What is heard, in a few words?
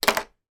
reading glasses down on table; foley